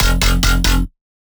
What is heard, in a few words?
Bass Shot F Hydraulic, Dubstep; Ghosthack; Advent Calendar 2018